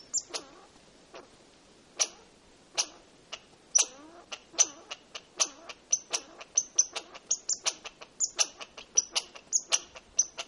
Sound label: American Red Squirrel Nasal Chitter; alaska acoustic highlights; MAMMALS